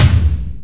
Boss DR-550 Tom Lo; 300 Drum Machines

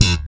POP F, bass; Spectrasonics - Bass Legends CD 1; ALL THUMBS

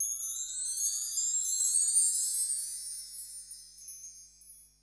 Bell Tree, Perc; Akai MPC500; LA Perc

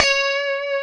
C#5, Guitars; Spectrasonics; Hans Zimmer Guitars VOL-1; 90's Sample CDs; COMP POP+VIB

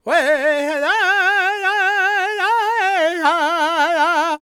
NA G# MIN, Vocal Planet World Voices LAKOTA; ILIO; Vocal Planet VOL-5

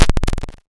Cwejman S1 Pipe Cleaner; DevSnd Cwejman S1 - JK; DevSnd Cwejman S1 - JK Samples

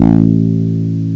ELE BASS; M8; CMI Factory

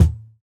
Kick, jungle breaks drum sounds

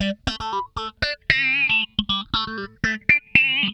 FAZE PLUK, Funk Guitar; 90 s Sample CDs; Zero G

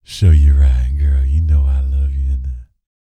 Vocal Planet R&B Dance BASS CATCH; Spectrasonics

